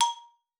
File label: A#4, xylophone; Xsample VOL-4